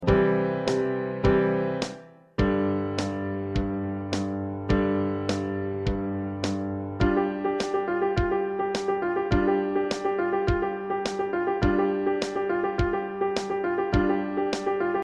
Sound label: Piano Drum